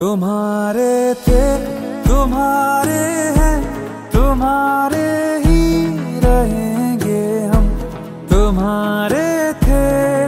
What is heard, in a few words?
Romantic Ringtone